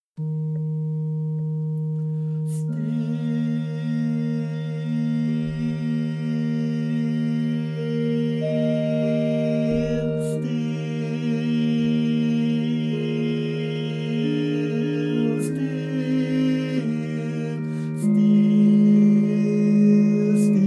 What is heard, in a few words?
Still Still Still Tenor, Christmas Choirs